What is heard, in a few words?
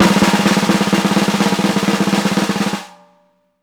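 ROLL, black Tama; Sampleheads; New York City Drumworks VOL-1; SP BLAK TAMA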